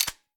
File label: sm reload mag release; gmod